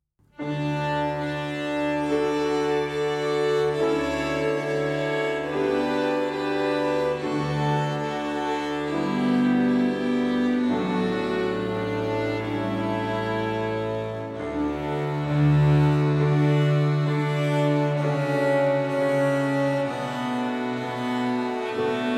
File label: Carillon; Everything String